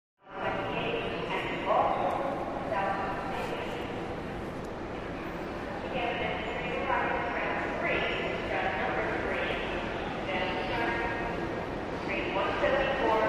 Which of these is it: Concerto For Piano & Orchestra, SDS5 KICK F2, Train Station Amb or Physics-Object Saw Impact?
Train Station Amb